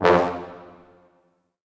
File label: Orchestral Brass